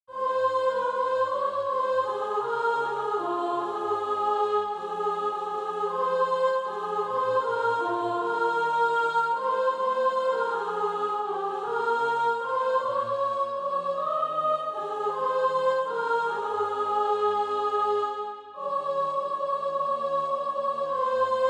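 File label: soprano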